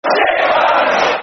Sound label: Football Chant; Shit Ground Shit Fans; Aston Villa Avfc